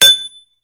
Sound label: hit